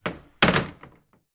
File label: door louvre wooden close